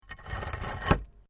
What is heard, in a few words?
PAVING STONE MOVE, dragging